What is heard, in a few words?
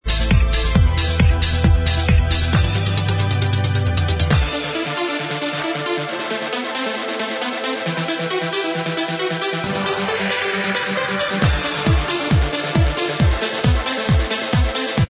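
instrumental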